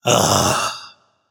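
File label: nonverbal ugh; smite; voicepack; merlin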